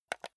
console controller home press

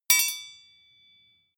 sword hit rattle ring

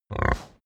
pig grunt close

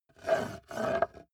metal scrape on wood light

